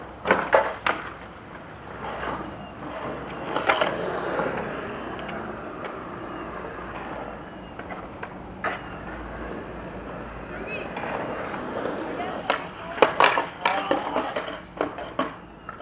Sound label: Sports Skate Park; SFXSource